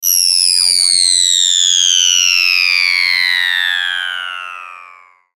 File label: DESCEND BOMB, Descend Bomb Drop Spinner, Descend Metallic Ring Jerky; Descending Sound Effects; Blastwave